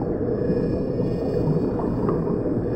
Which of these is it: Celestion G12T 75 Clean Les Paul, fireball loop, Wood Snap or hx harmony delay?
fireball loop